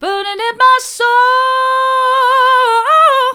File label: burning in my soul hard, vocal